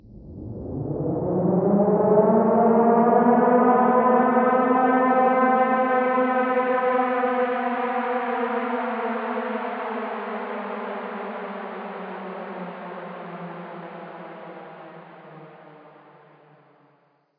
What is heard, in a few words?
siren; nuke siren